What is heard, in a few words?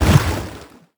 tentacle death; Crypt of the NecroDancer